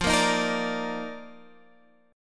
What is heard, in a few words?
D sharp Major 7th Suspended 2nd